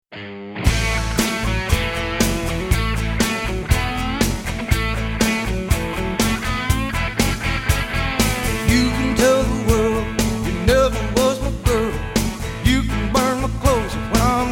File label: uke